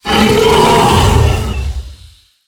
Death; Gahzrilla

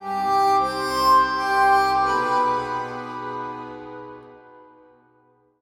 Play Stinger; Sayge

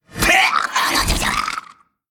Male Qiraji Death

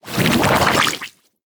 Green Jelly Attack

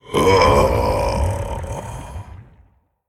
Elemental Death; Mantle Shaper

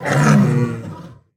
Tiger Form Death

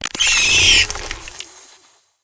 scarab death

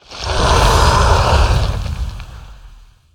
Rock Elemental Play

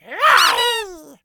Tiny Teddy Bear, Attack

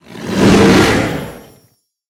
Crystal Lion Attack

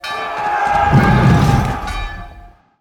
Castle Wall Attack

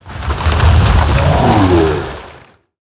Superior Golem Play

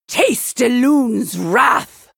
Female Night Elf Attack

